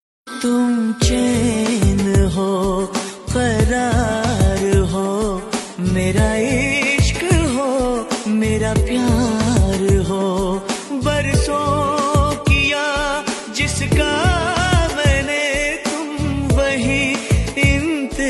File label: Song Ringtone